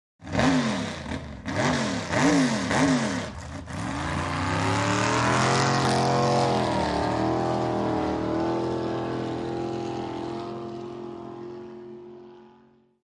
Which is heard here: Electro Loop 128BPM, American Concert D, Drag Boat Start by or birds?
Drag Boat Start by